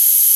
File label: NOISE TRACK; E-MU Formula 4000 Series Vol. 3; Analog Odyssey; VK+ Instruments